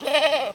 goat call; Gamemaster Audio; Animal Sounds

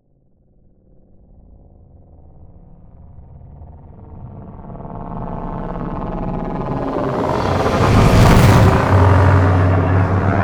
passby Space Ship speed variations Slow; SoundMorph; Solar Sky

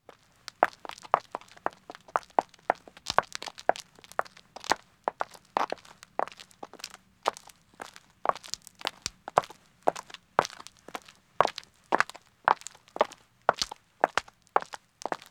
Country Road Walking 2 women; Footsteps; BBC Sound Effects Library

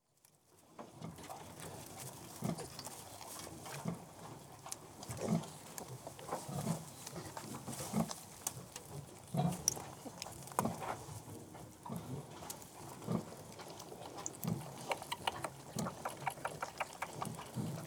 Piglets Suckling - Some Flies; Livestock; BBC Sound Effects Library

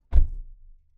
Mitsubishi Evo 8 Door Interior ORTF; Lancer Evolution VIII 2004; MKH8040; Pole Position

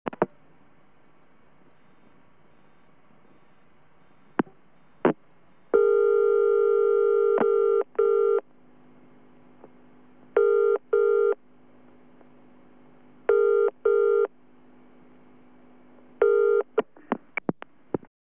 BT Lines AND Tones, 4 STD Ring; Computers Printers Phones; BBC Sound Effects Library

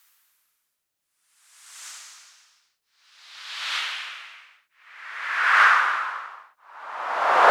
Fancy Sweep, White Noise

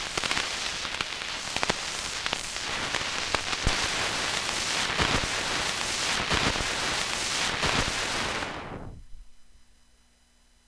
vinyl crackle; 99Sounds; Vinyl Noise SFX

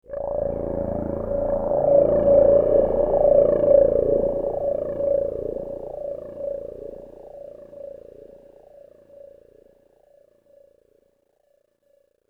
Aliens Monsters; FXHome

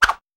whoosh, Hong Kong Action Kit; NoizBoy; Hit Kit 1

